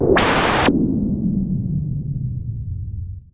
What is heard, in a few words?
noise SH101, Noise Sweeps